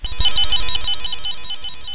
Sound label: bell; mazeofgalious